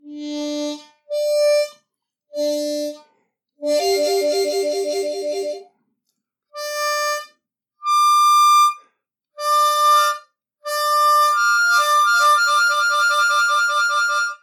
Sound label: Interval Trills, harp